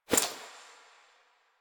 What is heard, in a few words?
Body Move; Frumentarius; Warframe; Ambience; Nineteen Ninety Nine; DE